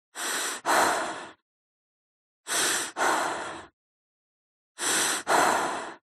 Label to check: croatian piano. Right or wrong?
Wrong